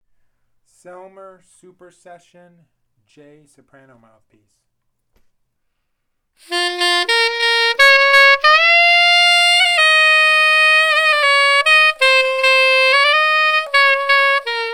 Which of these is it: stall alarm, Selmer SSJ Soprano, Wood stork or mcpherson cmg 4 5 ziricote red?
Selmer SSJ Soprano